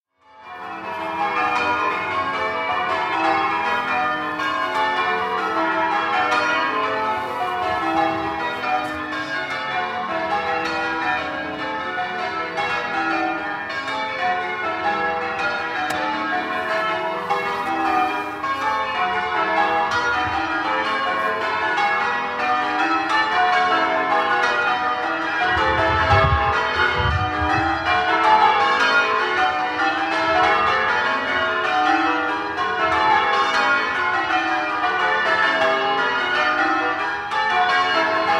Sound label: bells; hitchin